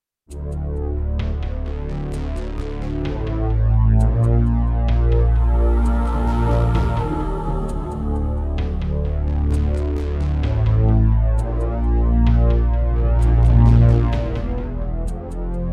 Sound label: Roland XV-2020; Descent II